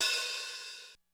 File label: HiHatOp, Korg DSS-1